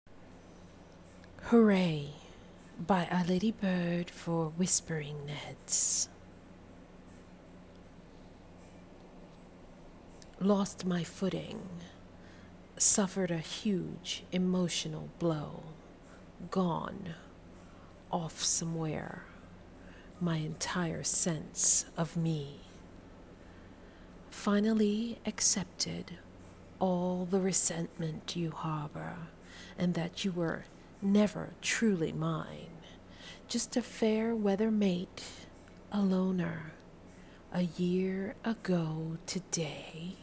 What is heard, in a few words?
hooray